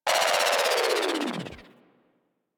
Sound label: Excavation Drill Loop End; Missions; Excavator